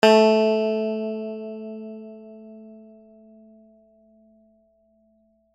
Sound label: String A3